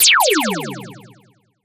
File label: science fiction laser; sound effects one